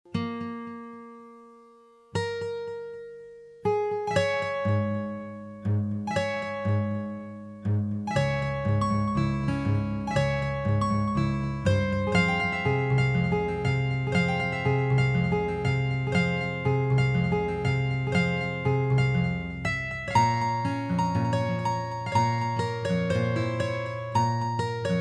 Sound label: Guitar